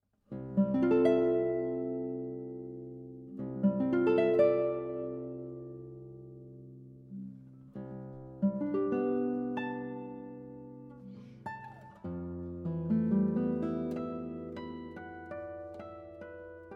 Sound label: Guitar Solo